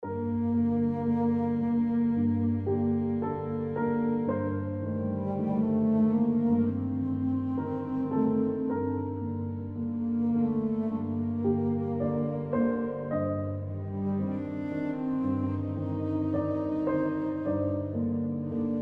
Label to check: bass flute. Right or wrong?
Right